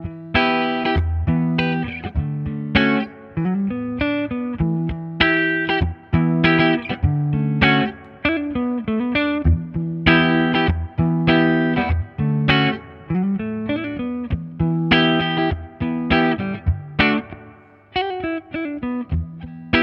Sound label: Reverb